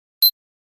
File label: nfc initiated, nothing phone